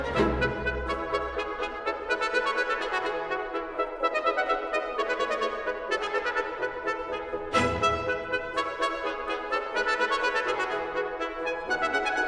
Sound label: Pulcinella, ballet with song, orchestra, Allegro